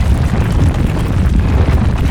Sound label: Quake Rumble Loop; SFX Kit; Destruction